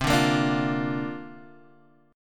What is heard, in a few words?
C 7th Suspended 2nd Suspended 4th, guitar